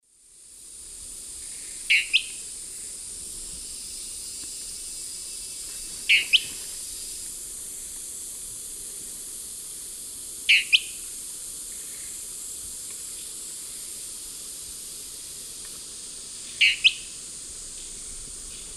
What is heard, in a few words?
Tyranneutes stolzmanni, song; S. America; Eastern Lowlands